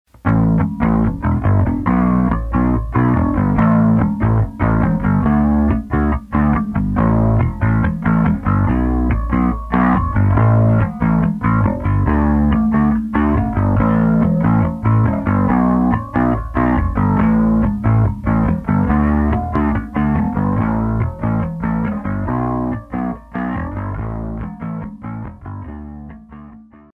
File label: pbass